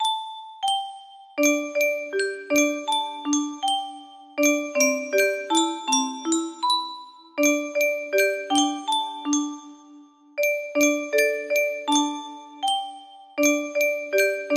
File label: music box melody